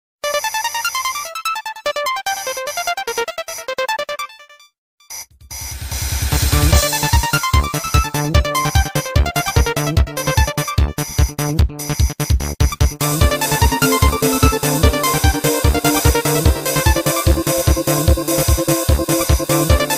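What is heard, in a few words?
Electric marimba; Nokia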